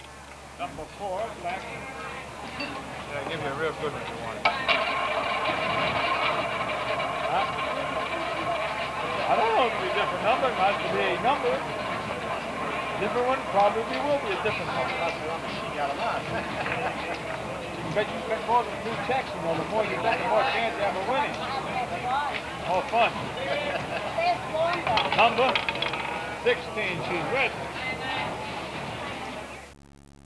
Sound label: casino; parks